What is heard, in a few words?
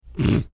snorting